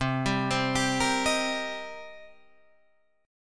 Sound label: C Minor 7th C Guitar Open D